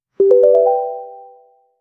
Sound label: short pleasant chime soun; correct answer